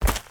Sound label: footsteps; smite; god merlin